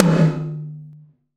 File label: Tom, Gated Reverb; musicradar